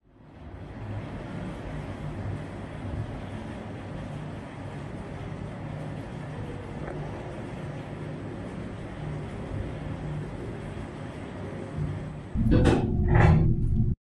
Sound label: Schindler 5500 motor